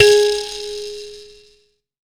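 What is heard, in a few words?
kalimba G#3, ETHNIC; AKAI S-Series CD-ROM Sound Library VOL-8; SET#1 ETHNIC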